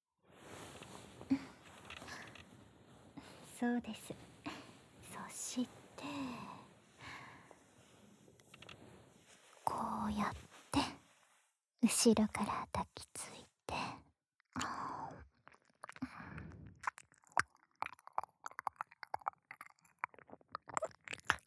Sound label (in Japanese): やさしい耳なめ（右）, ASMR; 暖炉なしver